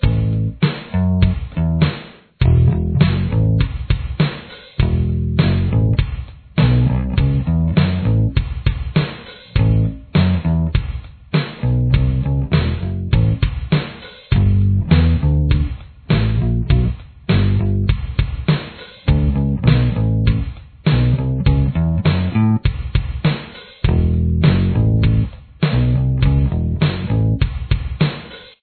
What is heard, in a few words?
solo bass